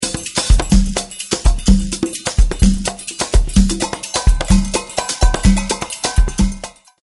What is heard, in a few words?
Brazillian Rhythm